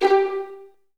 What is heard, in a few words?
VN FX, Virtuoso Strings